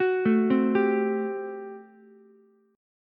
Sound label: G Major 7th Suspended 2nd Suspended 4th G down Ukulele; GM7sus2sus4 arpeggiated